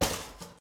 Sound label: Lantern drop; Phasmophobia